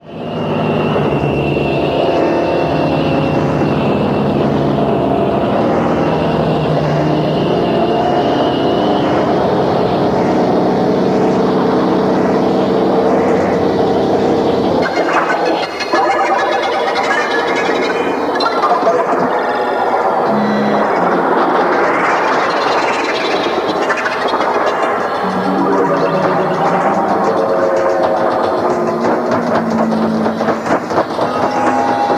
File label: Electrostatic